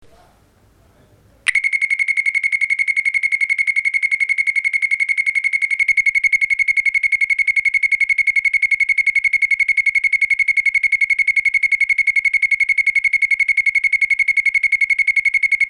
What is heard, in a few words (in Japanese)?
ESZ-3324 ﾎﾛﾎﾛ